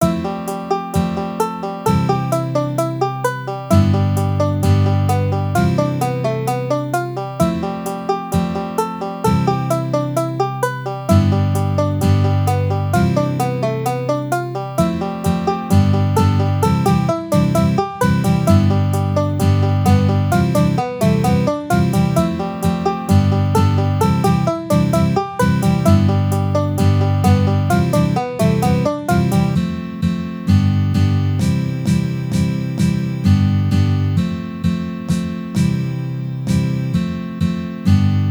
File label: banjo